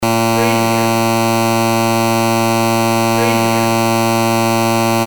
beep

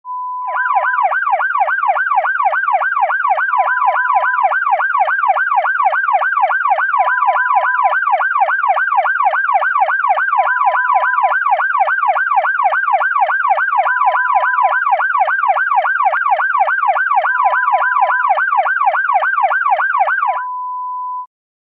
american police siren

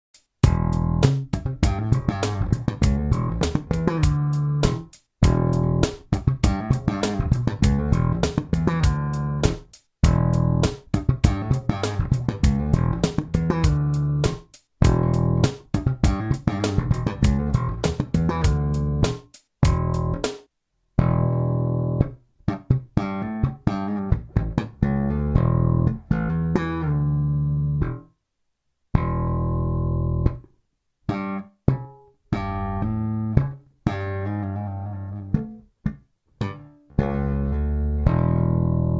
Slapline in E

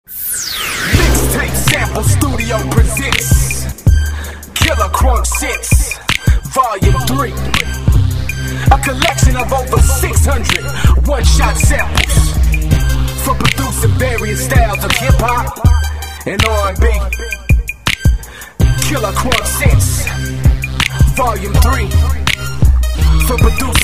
vocal mix

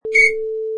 Referee Whistle

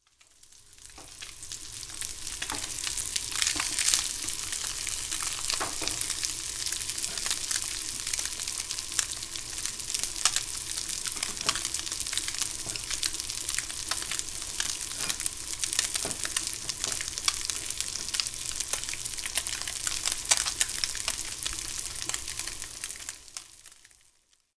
fry cooking

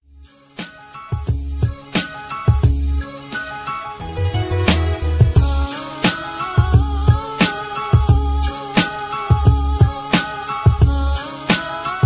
instrumental

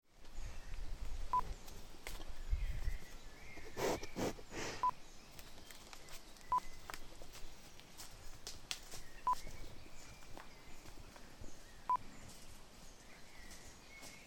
Cows In Valley

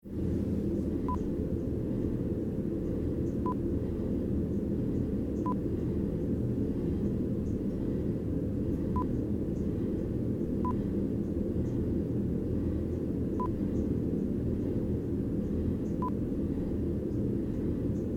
Swimming Pool Changing Room Ambience

